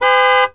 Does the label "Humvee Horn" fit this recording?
Yes